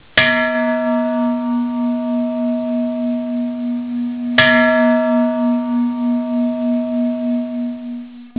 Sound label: bell